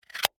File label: Mint Tin Lid Close, Foley, Mint, Tin, Lid, Close; Lids; Objects